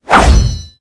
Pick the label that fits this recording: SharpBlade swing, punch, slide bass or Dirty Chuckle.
SharpBlade swing